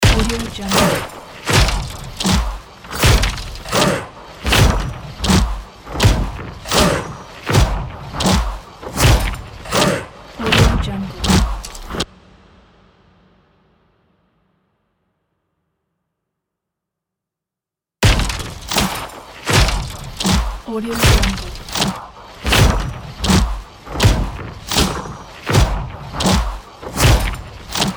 epic war marching

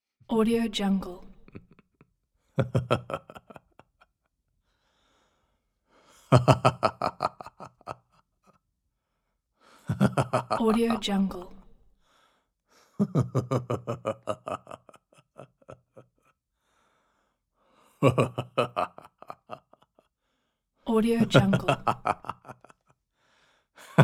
laugh male

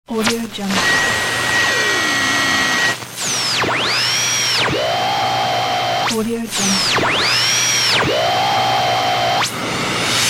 radio waves

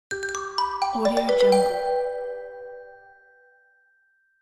silly boy

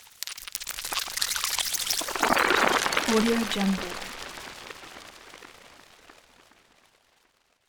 noise glass distorted various; soundmorph

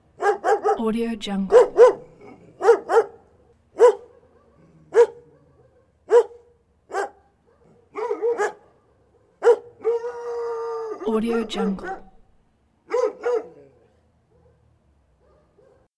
barking dogs

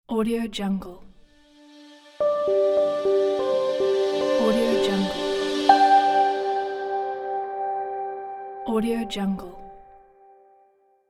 gentle piano logo

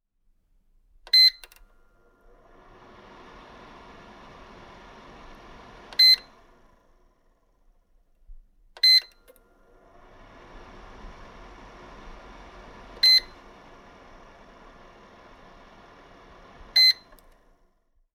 button to turn on the fan heater

